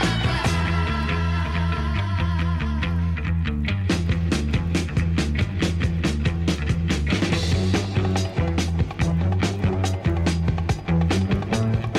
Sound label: arabian disco arabian melody